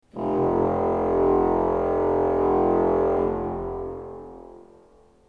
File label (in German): ton, orgel